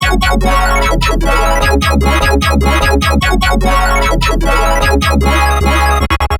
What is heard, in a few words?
Melodic Bass Loop F# 150, Color Bass; Loops; Infinite; Ghosthack - Color Bass Essentials